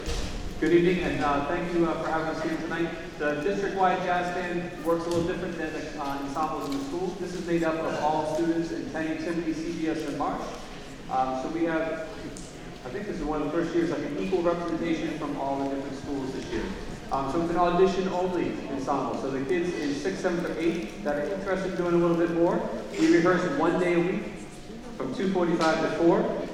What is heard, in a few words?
jazz blues; methuen